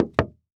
Foley Footstep Cowboy Boots Single Step On Solid Wood Platform; Foley Footsteps